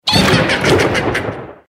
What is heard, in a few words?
Mother Witch Laugh; Emote